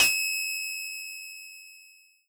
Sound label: toaster oven lowbit bell ring